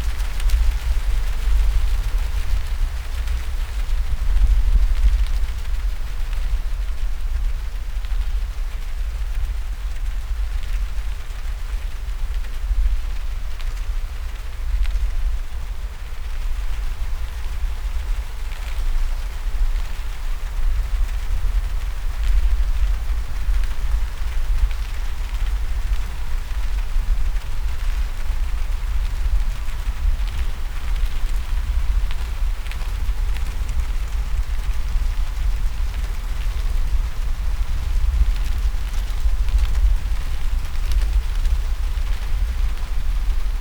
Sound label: Heaving To, ships